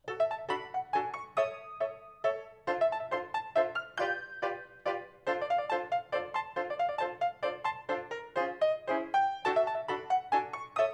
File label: NW Piano